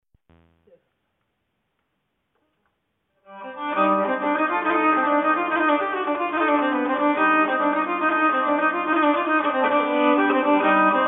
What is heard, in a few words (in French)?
Nyckel Rapide, nyckelharpa